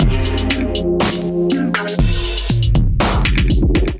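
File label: Kit 140 bpm, drums; MIDI Clips; 120 - 150 BPM; SwanSong Kit; Ableton; Factory Packs; Skitter and Step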